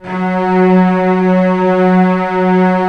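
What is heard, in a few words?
cello F#1; Digital Sound Factory Virtuoso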